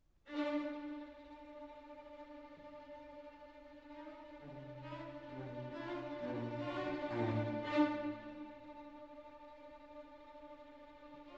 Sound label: Violin Concerto in G Major, Molto Vivace; Op. 11